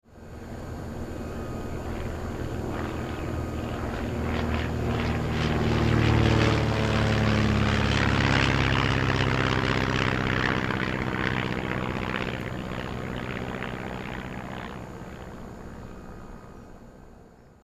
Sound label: Light aircraft pass by and then fading away; airplane